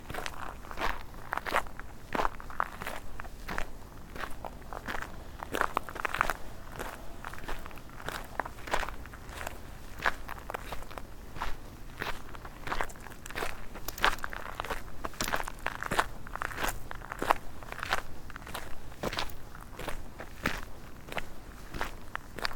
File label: walking